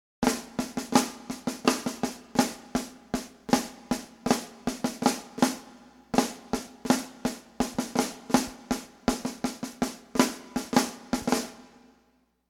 battle flam, drums; battle flam of the republic

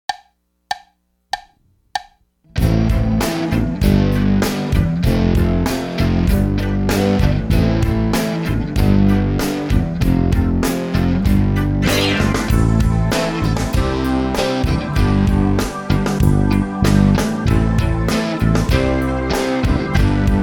changin it up, drums

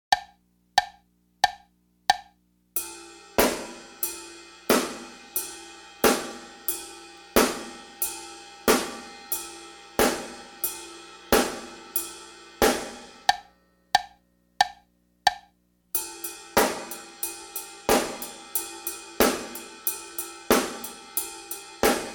snare ride; drums

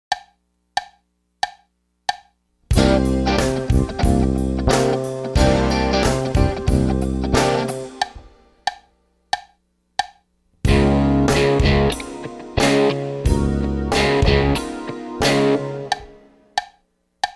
twelve great grooves, drums

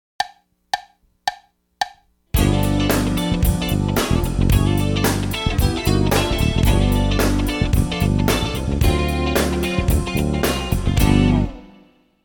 combo, drums

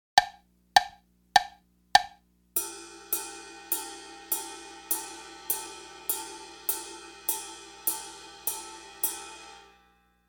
hit it, drums

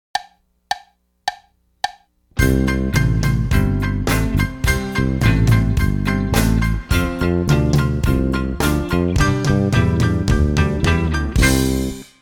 power toms; drums

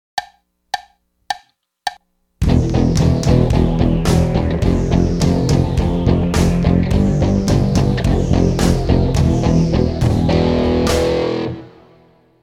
ridin the toms, drums

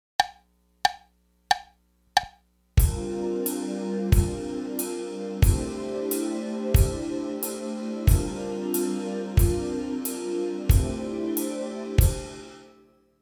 bass ride, drums

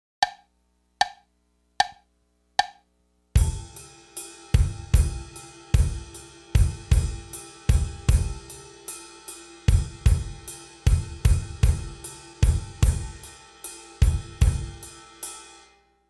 eighth rest, drums

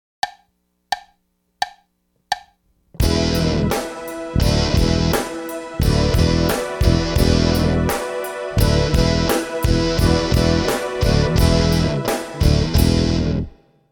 eighth ride, drums; 32 quarter ride 2

